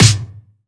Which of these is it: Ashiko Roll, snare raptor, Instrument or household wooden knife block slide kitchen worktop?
snare raptor